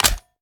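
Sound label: pistol reload extended mag mag in; gmod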